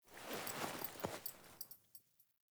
Foley Actions Wolf Jog Start, Clothing; Characters; Player

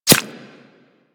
Arrow Impact Flesh; Warframe; Weapons

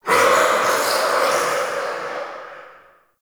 Crypt Spirit Vocal Death; Enemy; Champion Crypt Spirit

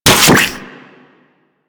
Venom Tick; Warframe; Saryn

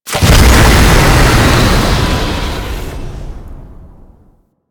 Tank Spray Start; Effervon; Weapons; Warframe; Lasrian

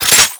Reload, Weapons; Strun Prime

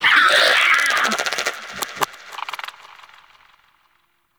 Vocal Death, Shrimp Lantern, Creatures; Baliq

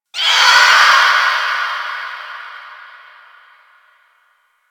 Infested Distant Creature Cry, Ambience; Warframe; Corpus Infested

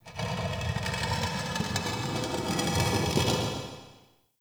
Stone Movement, Crypt Ambience; One Offs; Champion Crypt

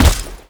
Heavy Step Default, Footsteps; Warframe; Tau Dax

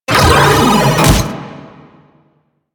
Proto Infested Mutalist Speaker Start Scream; Warframe; Abilities

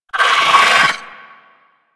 Amalgam Moa Satyr Vocal Pain; Warframe

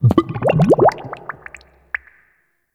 Gulp; Entrati Labs; Cavia Rank Up Scenes; Fibonacci

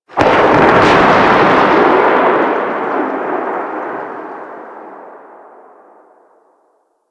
Shipyards Thunder; Warframe; Grineer Shipyards

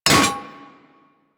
Melee Hammer Impact Generic

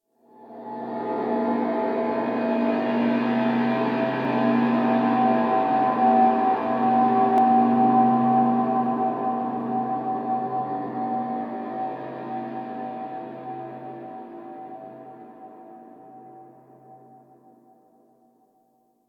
Exterior Texture, Ambience; Warframe; Rail Jack

